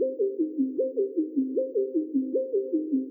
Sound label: Arp Loop; BB 2HTC Samples Vol 4